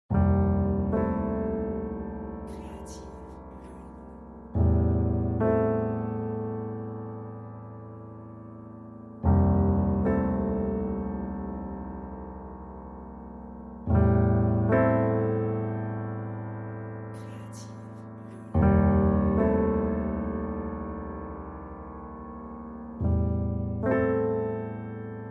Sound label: Dark Loop piano